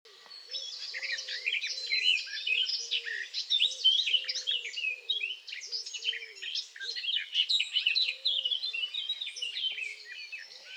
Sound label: Sylvia atricapilla; SETE